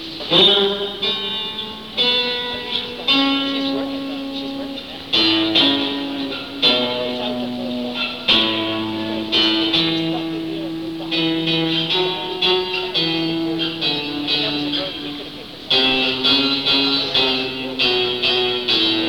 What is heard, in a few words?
tuning (soundcheck)